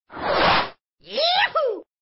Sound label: "yaahoooo"